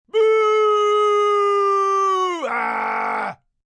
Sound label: Boo Ah, Booing, male, long boo; VoiceBox